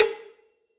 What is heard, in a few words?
Cowbells; Drums; Drum Hits; Ambient Loud Punch; Ableton; Session Drums Studio; MPE Hits